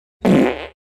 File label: Short wet human fart